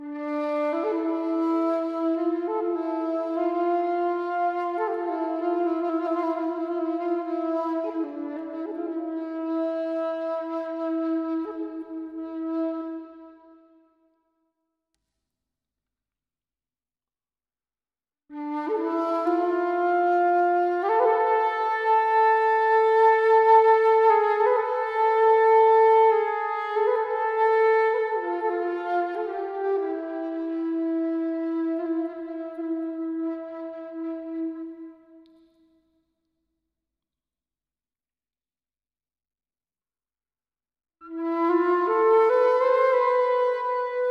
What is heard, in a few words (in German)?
Floete